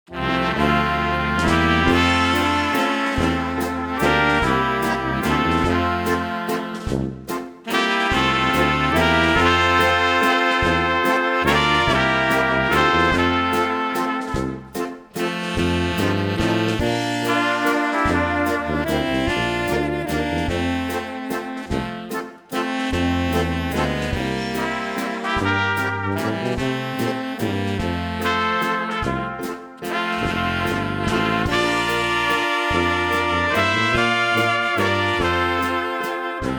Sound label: Waltz